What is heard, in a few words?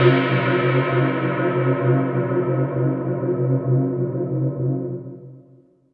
Synth Perc; Dream Zone